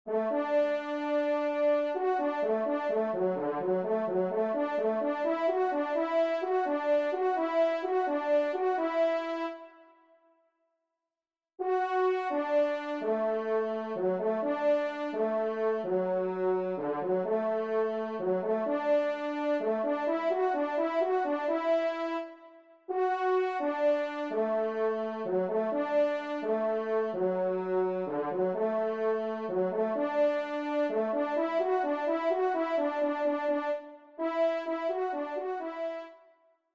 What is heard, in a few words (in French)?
Marche TRP; Marche Maurice Valery; Auteur : Maurice Valéry; 1e Trompe